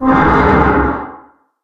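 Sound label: metal frame groan heavy; Source Engine; Portal 2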